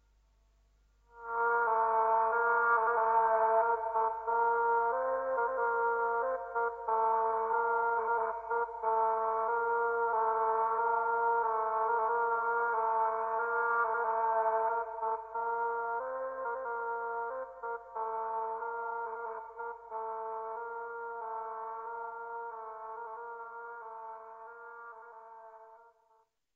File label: Castrated Oboe melody filtered with reverb